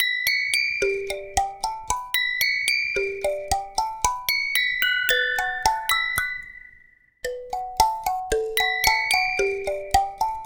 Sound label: Game Over